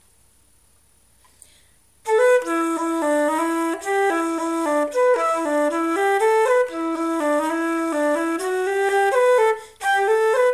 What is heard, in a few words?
slow; The Pullet